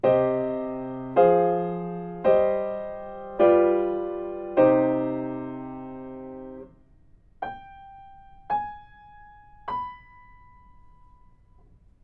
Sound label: melodic fragments; ear training